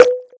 Hi Tech Drum Snare

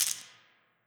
keys; foxdot default

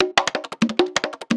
Perc, LIQUID; DNBACADEMY

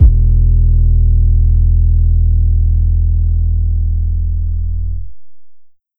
Kick 808

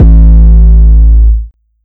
Kick Dist Long

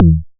Kick Edm

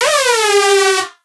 Trap Synth

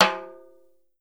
Perc Timbal

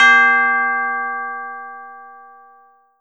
Bell Church

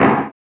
Snare Alt Gun Shot

